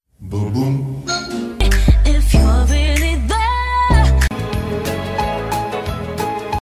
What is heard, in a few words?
mashup song; quizmas